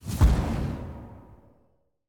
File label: lobby notif revoke; parties